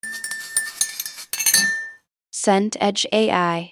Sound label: stir spoon mug